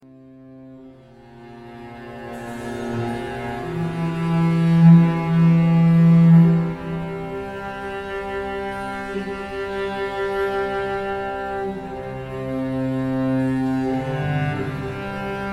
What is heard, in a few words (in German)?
Kotamo + Cello